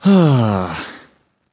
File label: nv sigh; smite; voicepack; mercury